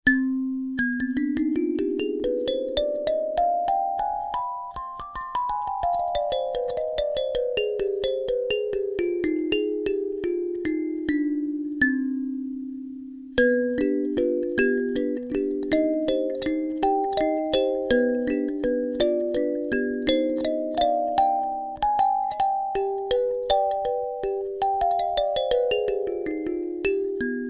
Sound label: Freygish Karimba